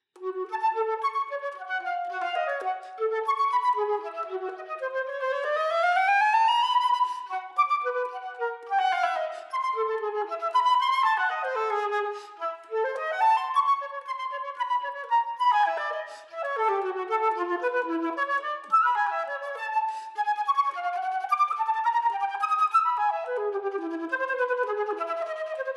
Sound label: flute performance